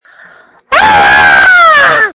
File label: Scream